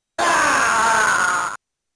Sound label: Death; Alien Soldier